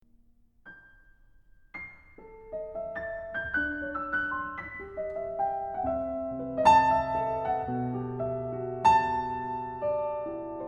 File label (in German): Ruhig Bewegt; Elf Humoresken; Lied Im Traume